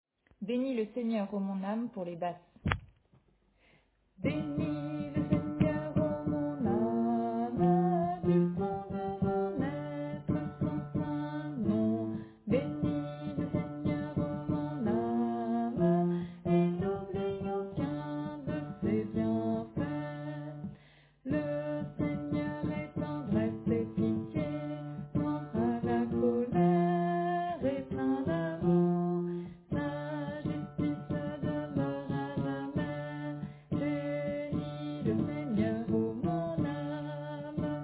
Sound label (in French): Basse